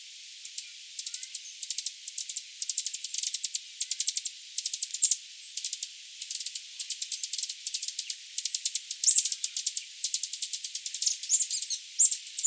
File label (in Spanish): Colibri cora Thaumastura cora